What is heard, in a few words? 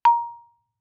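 Marimba yarn fortissimo Bb2; MIS Pitches - 2014; Percussion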